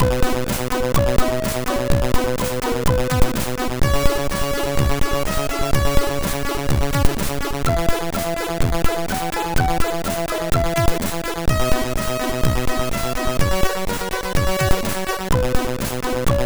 Rursig, MOS6581R3

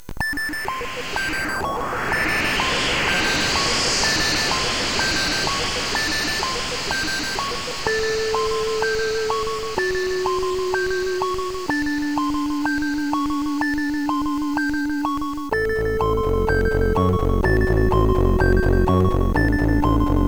Horror Song, CSG8580R5

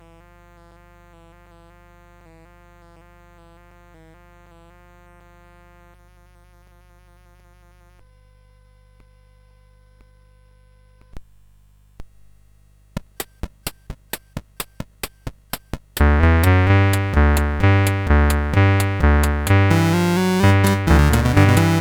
CSG8580R5; Roger Ambill

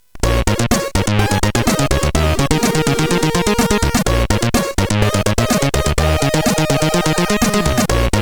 Funk Bass, CSG8580R5

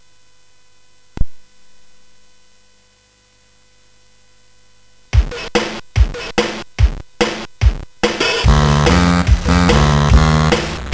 Crowd, sid CSG8580R5

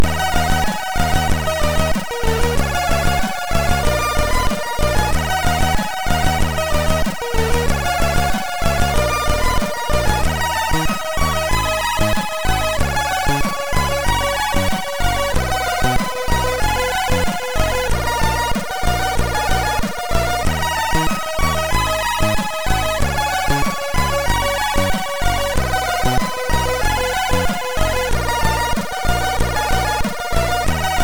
Magic Flute, MOS6581R2

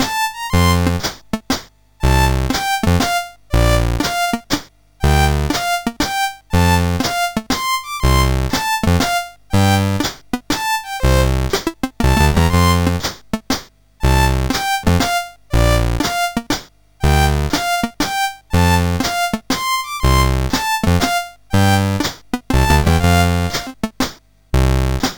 Waltz, CSG8580R5

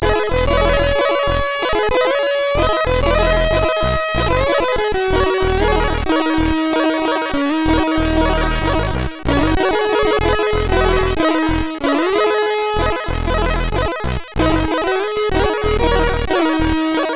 Melancholy, MOS6581R3

